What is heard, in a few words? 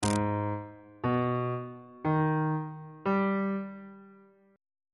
g sharp minor major 7th chord intervals on bass clef